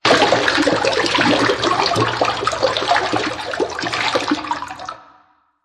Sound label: Pouring Blood; Red Dawn